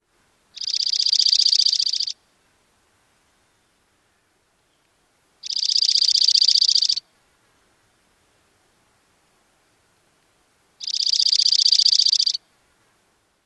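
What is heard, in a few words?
Dark-eyed Junco songs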